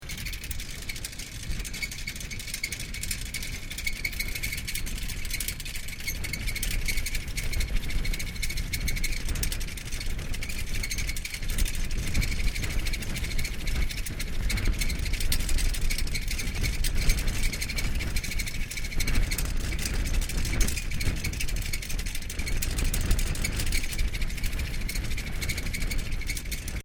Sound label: Chimney wind fan operating loop; Various machines; Fans; Electronics